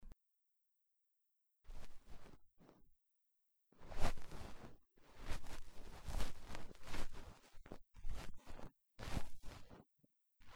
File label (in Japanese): 耳かき(右耳), ASMR